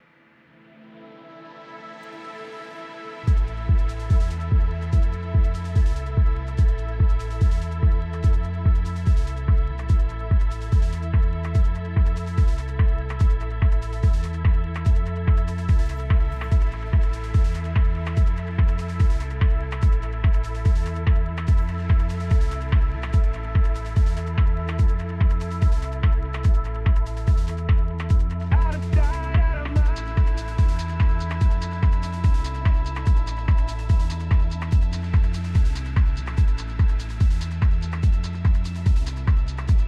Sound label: Intense Cardio Workout Soundscape; Endel